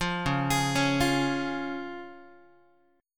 Db Major, Guitar; arpeggiated; JGuitar

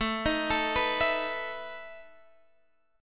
E Major 7th Suspended 4th A

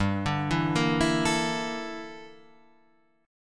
G Minor 6th Add 9th G; Gm6add9 Chord {3 5 2 3 5 5} arpeggiated

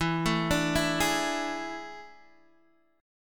A Major 7th Suspended 4th E

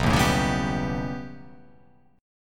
B Augmented 9th B, chord

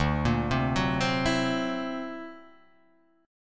D Augmented 9th down, guitar

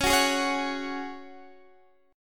C sharp Suspended 4th, Guitar, Drop D

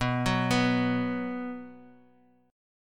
B 5th B down Guitar Standard; B5 arpeggiated; chord shape; JGuitar

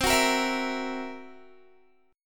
C 7th Flat 5th C down Guitar; C7b5 strummed

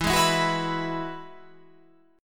E Major Add 9th

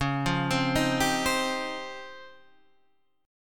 Db Major Sharp 11th, Guitar Half Step Down

